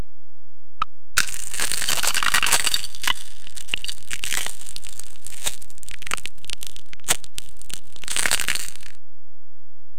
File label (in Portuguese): som de granola, crunch